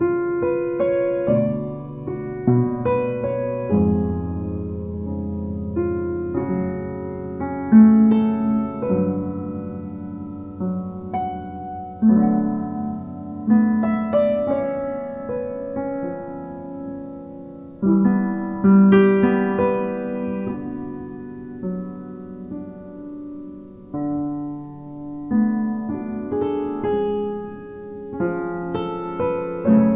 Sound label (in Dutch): improvisatie (stereo)